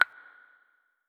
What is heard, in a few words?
Kopete status; KDE Borealis